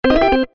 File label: Slip Notification, Organ; HTC